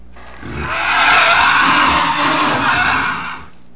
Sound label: temp creature; The Elder Scrolls III - Morrowind